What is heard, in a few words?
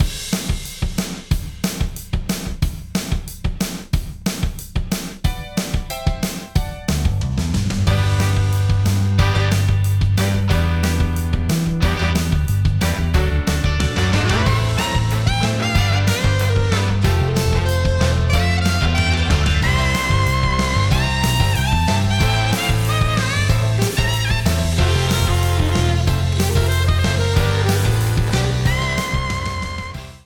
SNARE more gate; STAR NURSERY MUSIC